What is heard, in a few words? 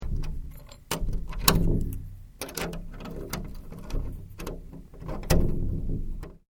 Door Wooden Old Latch Lock; Various doors; Gates